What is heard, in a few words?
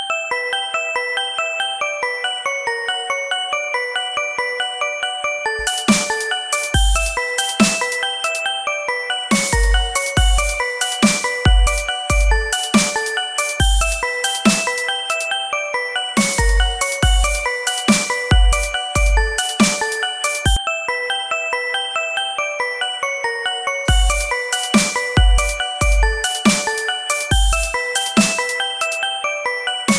Beats